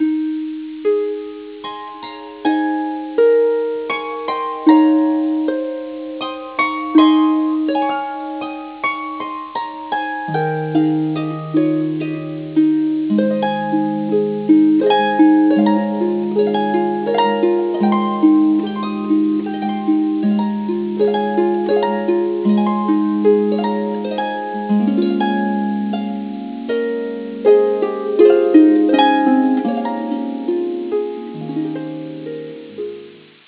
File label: harp